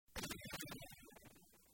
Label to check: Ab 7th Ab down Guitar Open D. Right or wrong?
Wrong